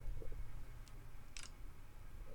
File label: Phone lock